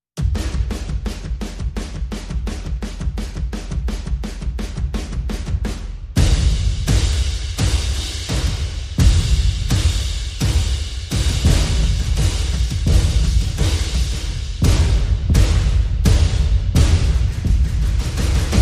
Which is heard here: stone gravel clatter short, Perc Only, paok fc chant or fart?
Perc Only